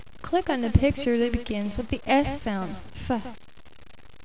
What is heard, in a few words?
SHH